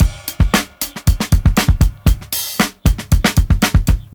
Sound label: 116 bpm drum loop g key